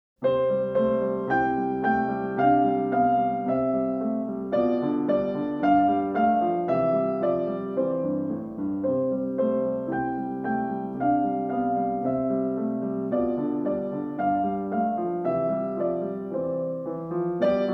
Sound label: Dance of Two Seahorses piano